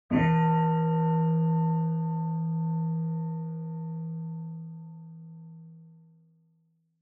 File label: Bonsho High